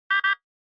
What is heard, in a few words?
Retro Anime System